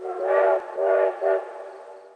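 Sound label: whistle; Mafie 1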